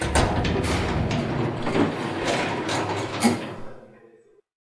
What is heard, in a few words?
hiss door open